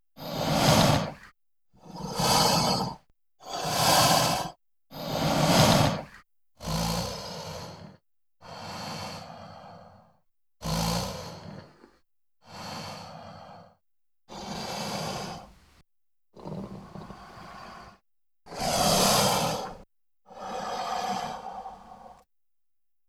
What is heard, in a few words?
Breaths Synthesized; Vocals & Wallas